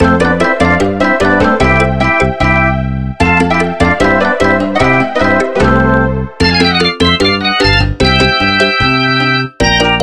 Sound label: Win Game